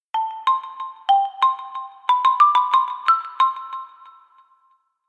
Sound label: giorno huawei